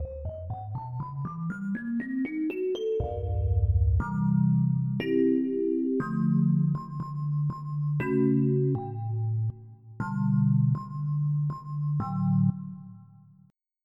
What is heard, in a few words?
Epiano; FF7